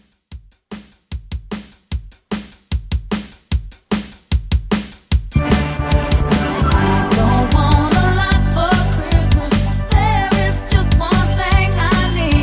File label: Christmas Re Drum; Xmas